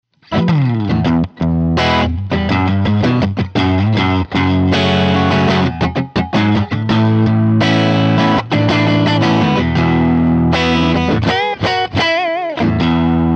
jazzmaster; g10cs; pro jr

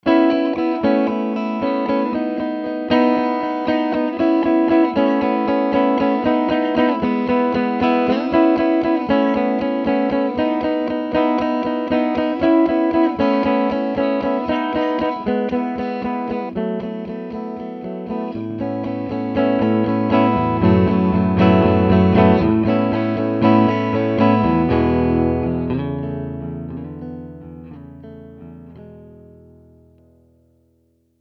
Retro 10 clean

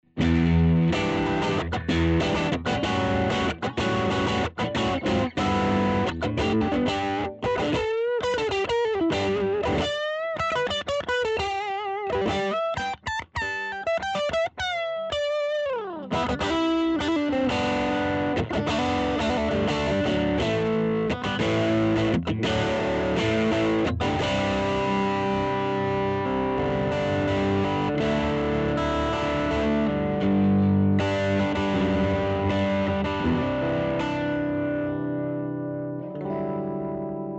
dirty

